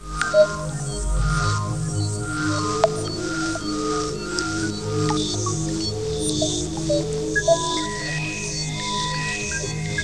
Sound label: Pad; Great Eagle 2